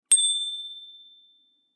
wink

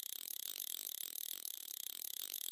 spin

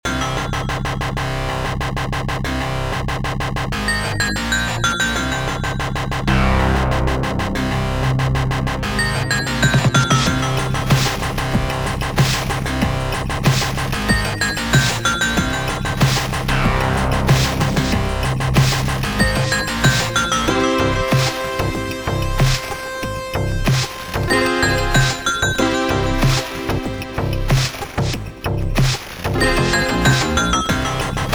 music story sequence

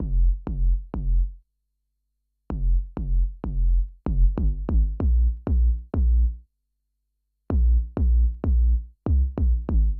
BASS, viral hiphop

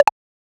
chat

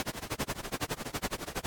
boomerang

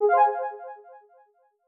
chat notify

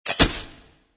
camera

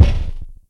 kick

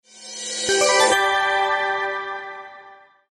victory; Tower Crash

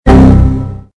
beep error

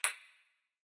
Bullet Bounce

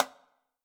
hit, electronic

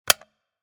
button

